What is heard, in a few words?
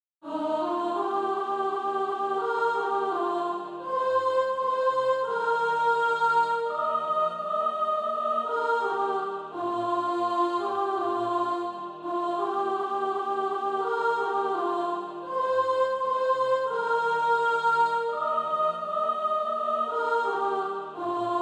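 soprano, SATB Practise Music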